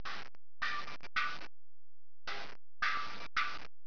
tympani; Image Bonanza; music

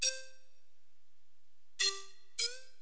breat; Roland; An Invitation To The Roland World Of Music

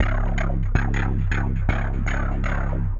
AMBNT 160; Ambient

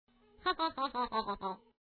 hehe; christmas adventure

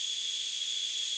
Engine

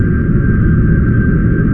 Start Engine; PC Gamer IT CD 47; USAF; USAFDEMO

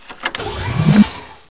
Health Pickup; Gunlok

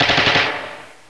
acannon

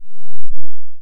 HQ Smooth Inv Saw; flstudio502

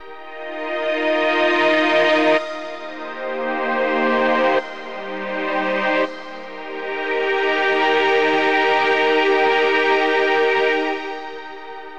SYNTHPAD AMBNT 160 C; eJay; Ambient; Sphere

